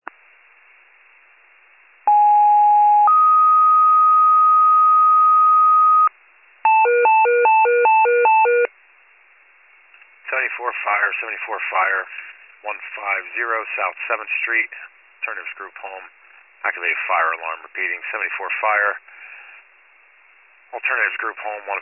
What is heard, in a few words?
fire, pager alert